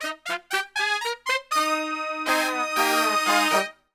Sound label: POP EB, Brass; Best Service; Brass Super Section; POP LICK